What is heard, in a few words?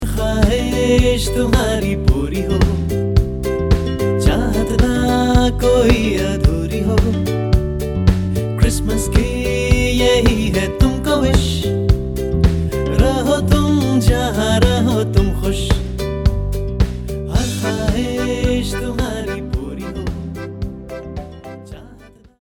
CHRISTMAS HINDI MALE